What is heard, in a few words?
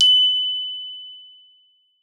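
GLOF F#5, glockenspiel; Xsample VOL-4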